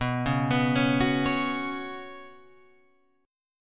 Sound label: B Suspended 2nd, Guitar, Open F; arpeggiated; JGuitar